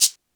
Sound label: Shaker Standard; Lost Tapes From Mars